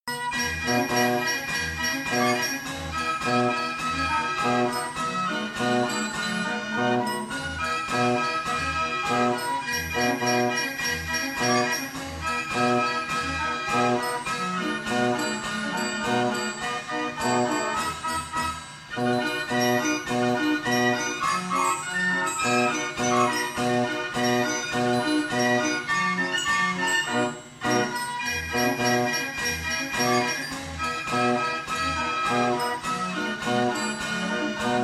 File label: polka